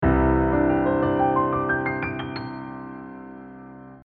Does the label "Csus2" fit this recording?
Yes